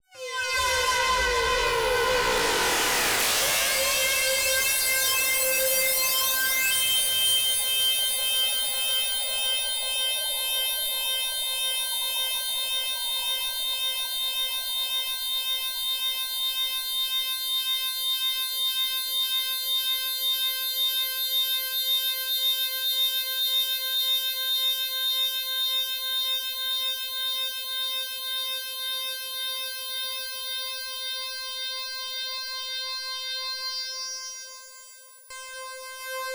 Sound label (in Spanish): Loops mutantes